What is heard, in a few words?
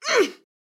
woman injured grunt